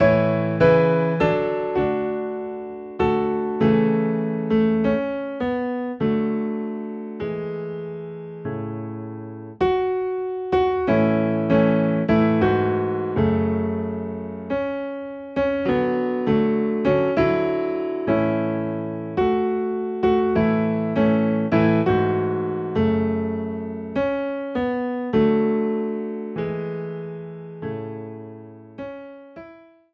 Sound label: Piano in A